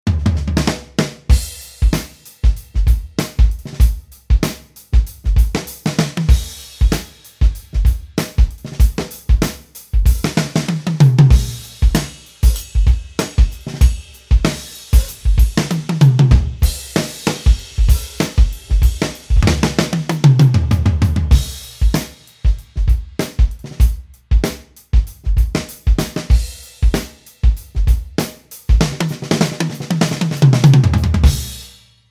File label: Mapex Tornado